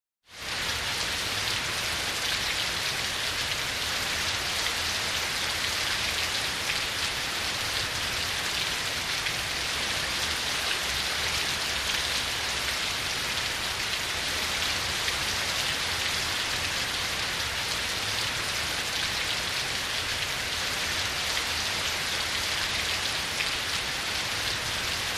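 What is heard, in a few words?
Rain Heavy Rain Puddle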